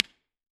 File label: AC30 brilliant+bass U67 stalevel, IRs